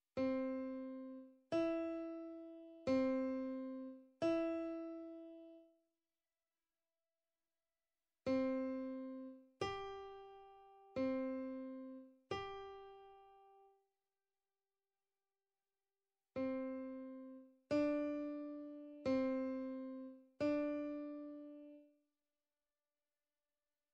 Recognise Notes Key C E G D. Key G D C A Key F C Bb; Grade 2